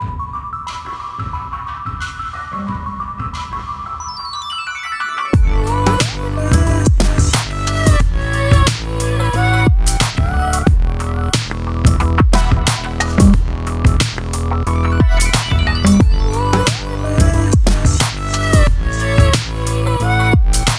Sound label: Music Rhythm Stage; sayonara wild hearts 2019 gamerip